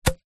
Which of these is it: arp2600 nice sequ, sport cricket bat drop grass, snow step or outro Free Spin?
sport cricket bat drop grass